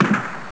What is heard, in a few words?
CANNON